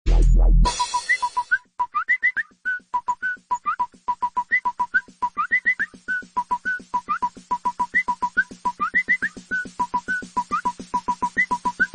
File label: SamSung Galaxy S7 New Tone